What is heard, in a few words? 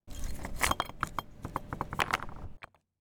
Rocks Falling